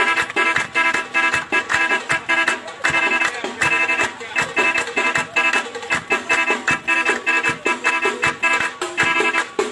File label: beep